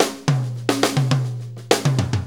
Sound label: 4-4 Fill, Drum Loops; Sonic Foundry (Sony Creative Software); Drum Tools; Fills